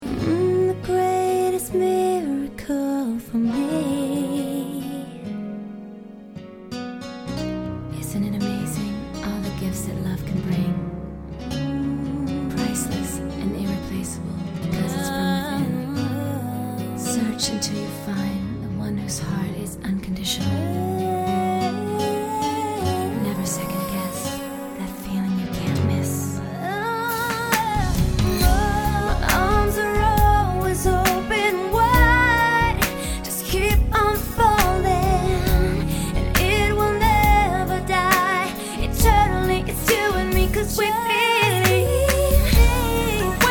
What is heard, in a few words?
Pop Ballads